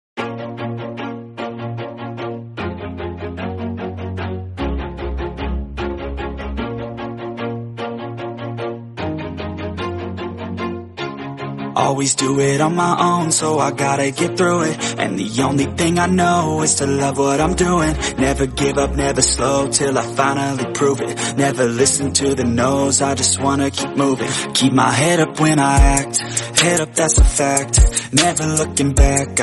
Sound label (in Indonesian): Nada Dering Lagu Barat Remix English